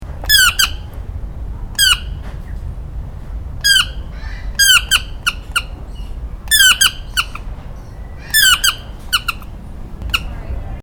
toucan sound; animal sounds

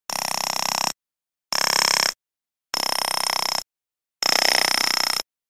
Commersons dolphin; animal sounds

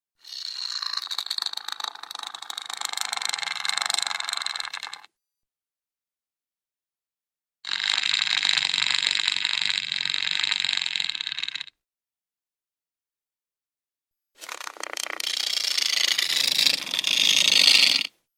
kingsnake sound; animal sounds

